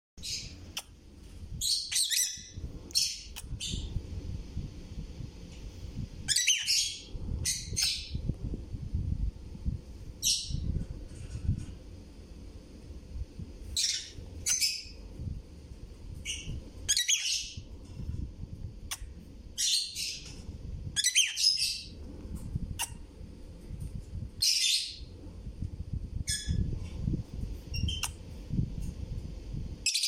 sedaye toti

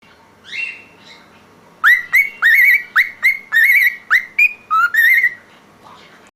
Aroose holandi soot zadan ba ritm; madeh va nar baraye joftgiri

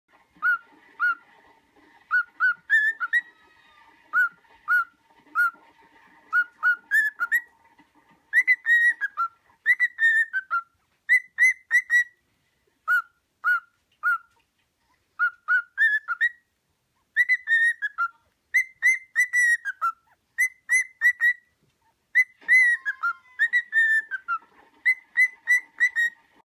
Aroose holandi Nar; madeh va nar baraye joftgiri

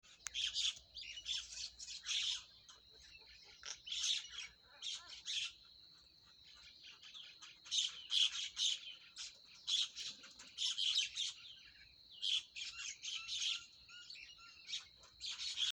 Sedaie Morgh Mina Nar; morgh mina nar o made sokhango

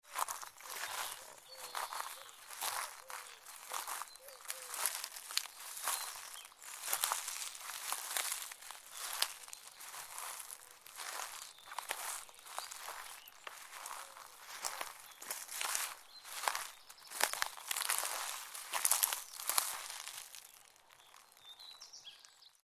sedaye barge paeez, autumn leaf rustle

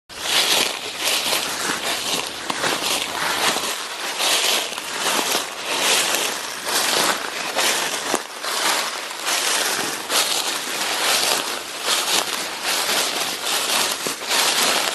sedaye barge paeez, autumn leaf rustle

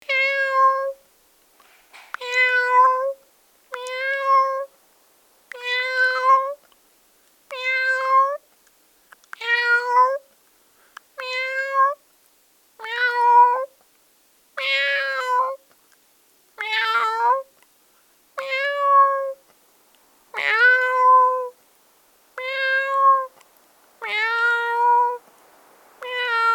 sedaye gorbe

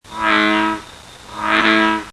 Sedaye ghorbaghe baraye zang mobile

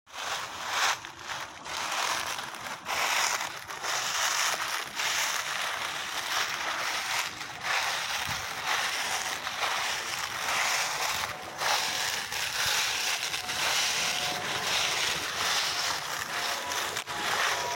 sedaye barge paeez, autumn leaf rustle

